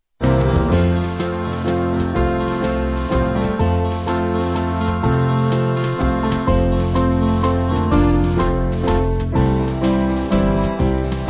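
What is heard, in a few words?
Band, Music Backing; We Praise Thee O God; Husband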